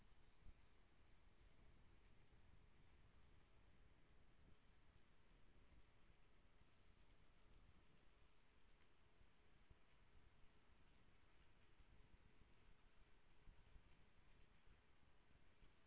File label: HYDROPHONE 16000Hz; JASCO AMAR HYDROPHONE 740